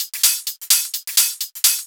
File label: Hihat Loop 128 BPM